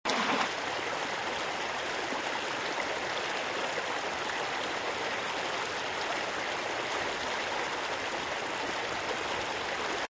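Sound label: river close up; Река крупно; звук реки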